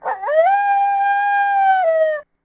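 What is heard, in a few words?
Wolf Fidget Vox; Civ4 warlords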